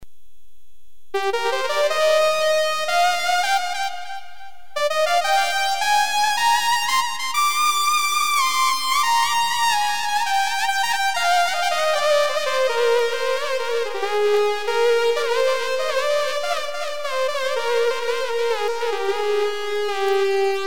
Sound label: lead, gw 8